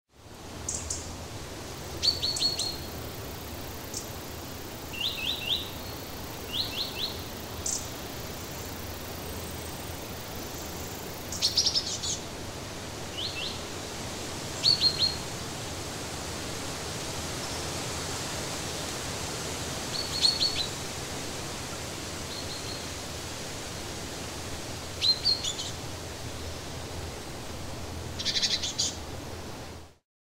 Ambiente en bosque Efecto de Sonido Forest ambience Sound Effect